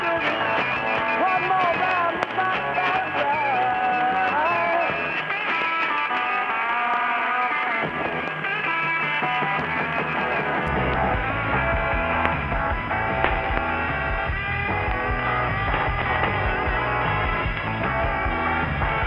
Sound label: Pirat Radio 1653khz AM